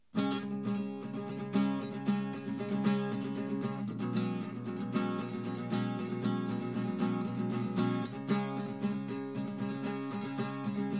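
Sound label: no drums, instantaneous, source; SiSEC11; underdetermined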